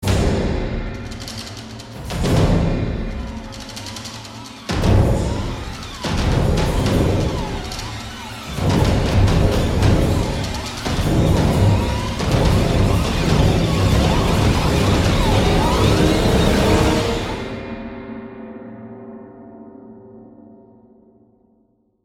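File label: Music Trailer Crash Mid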